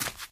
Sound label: dirt, footsteps, run; player; source engine; left 4 dead 2; witch